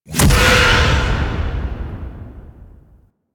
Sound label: Queens chamber braid destroy; Warframe; Ambience; The War Within